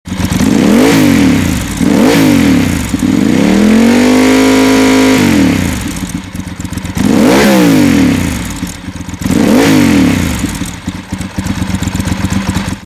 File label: ducati 748 sound, exhaust